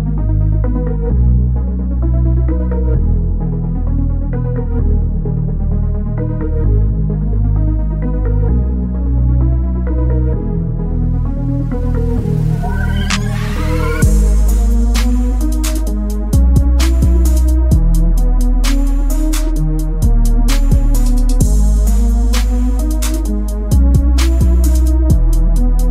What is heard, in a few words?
LG K20 Ringtone